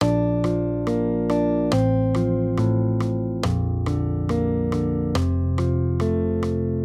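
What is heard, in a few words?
Guitar, Accompaniment, Major Triads, Bb-F, 140 BPM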